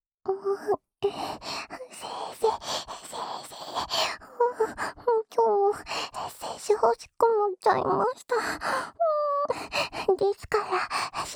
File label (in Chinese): 浅浅的舔耳（右耳）; 辅助自慰用